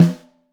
Top, Snare

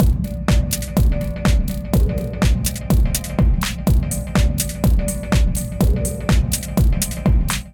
Sunday Morning Kit 124 bpm; MIDI Clips; Ableton; Punch and Tilt

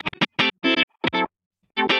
Funky Rhythm; Inspired by Nature by Dillon Bastan; Vector Grain Presets

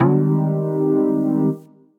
Sine Chord, Synth Lead; Ableton; Skitter and Step

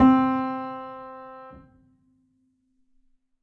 Upright Piano; Piano & Keys; Ableton; Factory Packs; Spitfire Audio

